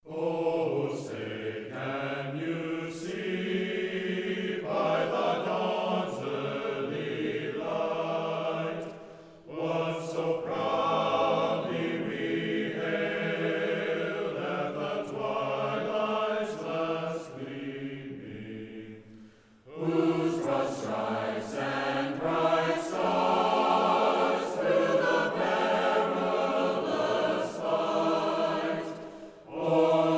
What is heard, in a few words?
Choir; Star Spangled Banner; Colorado